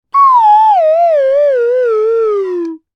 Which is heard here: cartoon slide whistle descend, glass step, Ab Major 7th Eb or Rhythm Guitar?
cartoon slide whistle descend